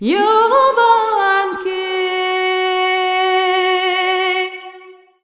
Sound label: VOX, Balkanish Traditions